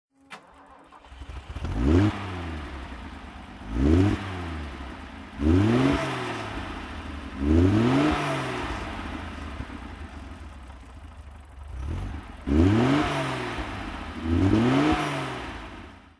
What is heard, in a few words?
alfa romeo 2600 sprint throttle